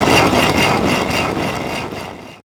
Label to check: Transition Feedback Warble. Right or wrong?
Right